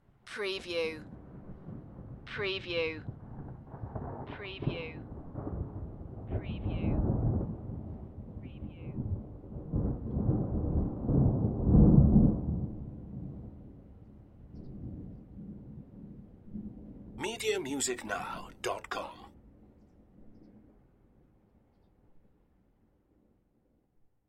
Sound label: Thunder Rumble Growing intensity